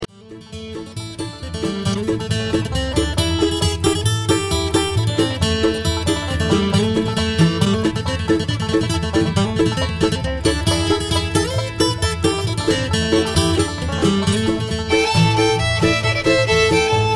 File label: Bluegrass Band